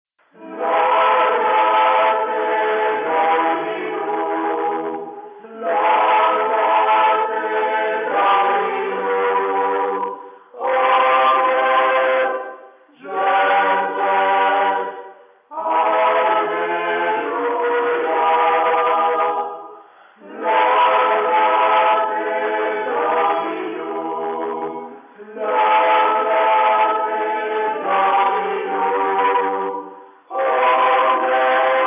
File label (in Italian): Tutti; Laudate